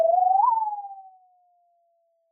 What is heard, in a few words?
Sound of an owl